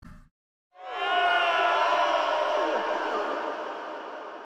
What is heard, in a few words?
undead building sound